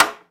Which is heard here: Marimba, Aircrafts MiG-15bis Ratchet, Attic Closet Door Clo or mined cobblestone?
Aircrafts MiG-15bis Ratchet